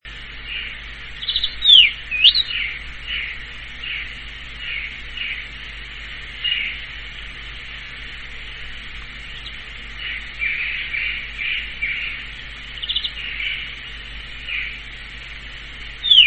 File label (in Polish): nietypowy śpiew dziwoni